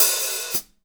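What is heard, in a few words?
hat open close, drum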